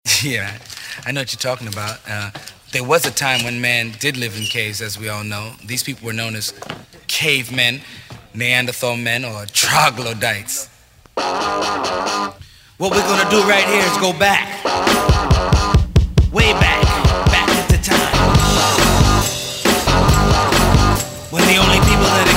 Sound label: noise punk mix